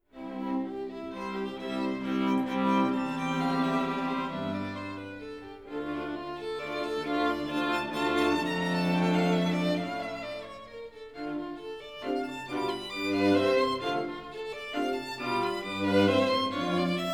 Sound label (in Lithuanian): Styginiu kvartetas F-dur; Mozart